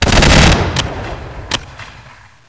INFANTRY; INDEPEND

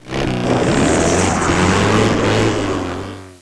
cavalry; APOCALYP

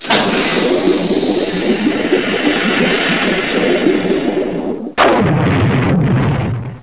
torpedos; WW1

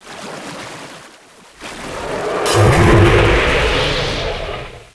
BIG GUN; DINOSAUR

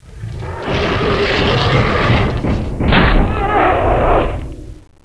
CAVALRY; DINOSAUR

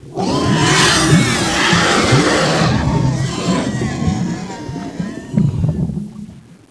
BOAT SINK; DINOSAUR

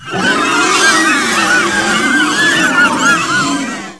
BARRACKS; DINOSAUR

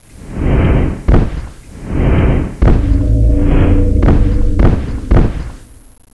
AQUEDUCT; DINOSAUR

